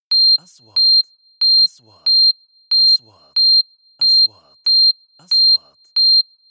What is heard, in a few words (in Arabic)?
نغمة تنبيه طبي; transitions